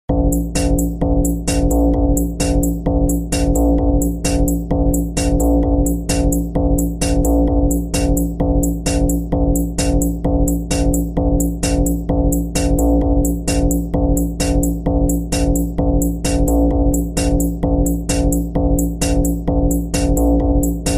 Super Bass Music Pancadão

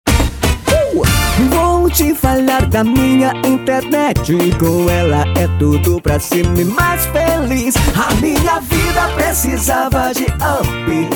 jingle, provedor de internet conexao informatica; jinglebrasil

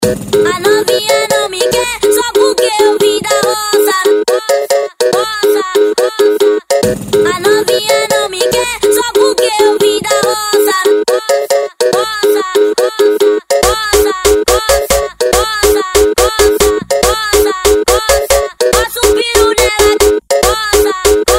eletronica; parana edicao fervo 2016

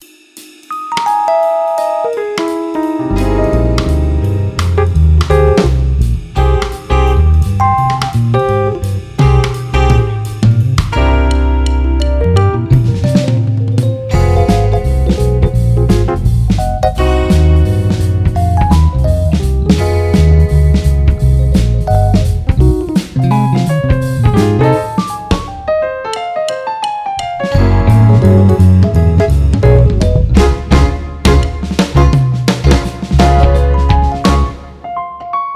Jazz Live, teclas, Instrumental

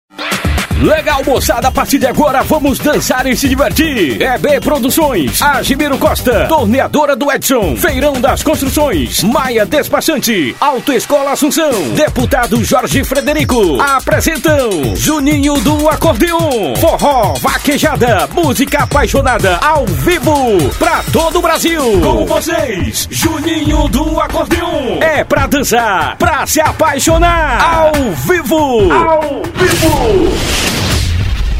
abertura

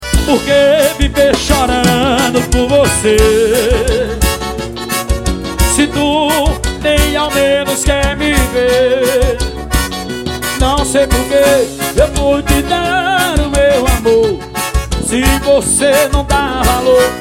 forró; Manaus AM

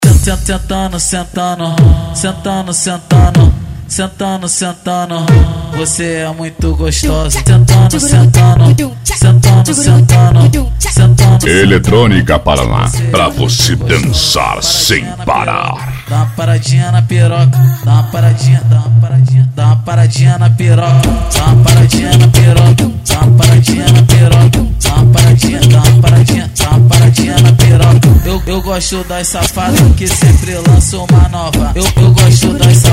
eletronica; parana; edicao fervo 2016

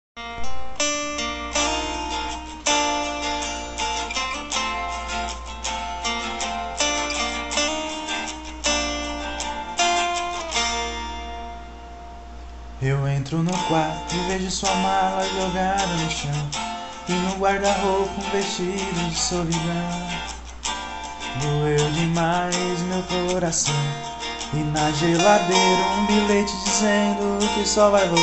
(Cover)

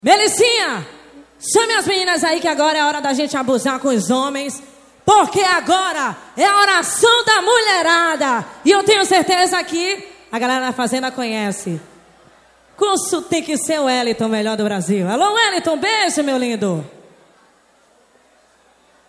forro promo; studio movel

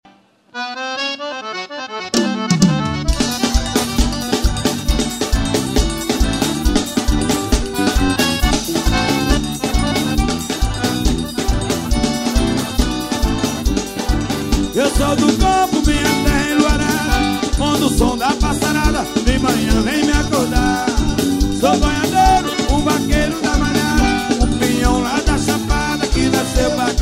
Acordeon

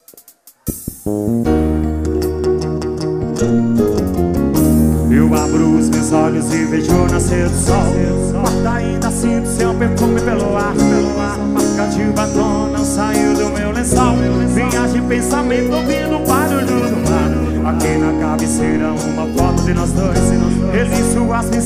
forró

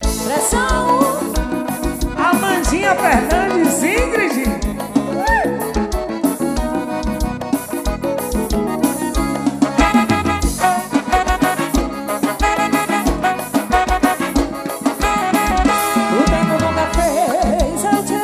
Forro Universitario

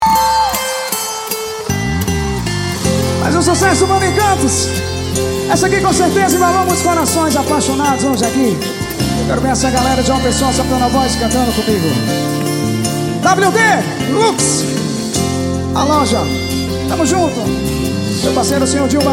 forró; forró verão

